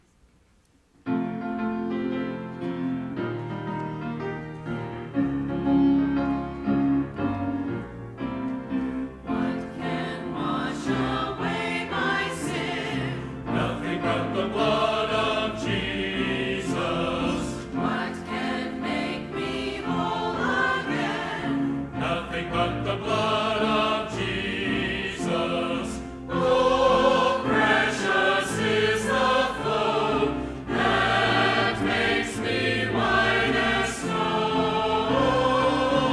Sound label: medley choir